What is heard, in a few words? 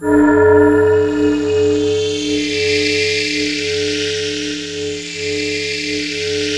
ring mod; Zero-G Ambient 1